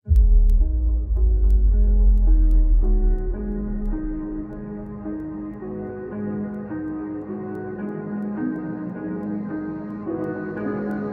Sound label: remix slowed reverb